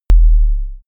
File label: sub effect